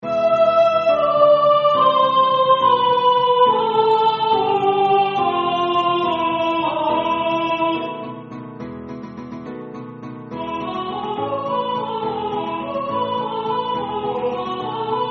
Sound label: Alt Solo; Los Pastores